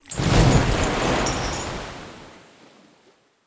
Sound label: alarm warship; ships siege